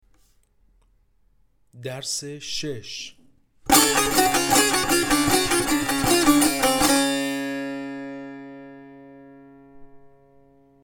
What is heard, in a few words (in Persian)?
مشق تنبور